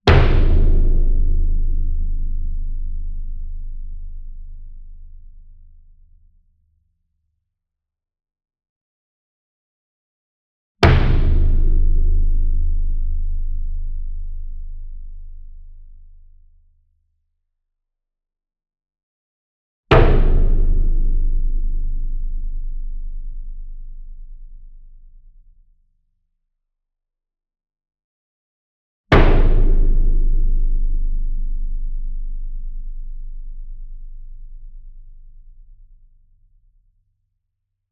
SINGLE DRUM Bass Drum Concert 40 Inch Hit Soft Beater Medium; boom library; Cinematic Strikes Construction Kit